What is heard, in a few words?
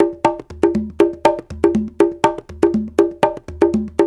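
120 bpm, African Congas; SOUK; Spectrasonics; Supreme Beats; African Contemporary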